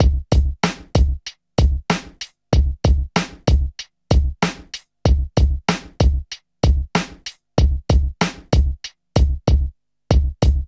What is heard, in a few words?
HIPHOP, Funky Xtreams; INIS